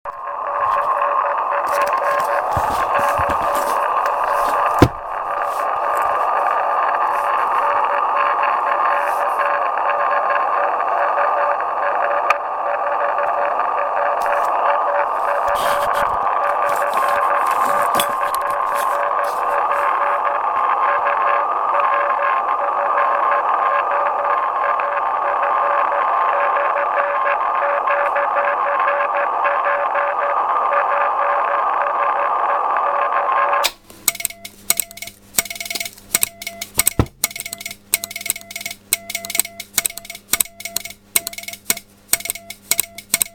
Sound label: QRP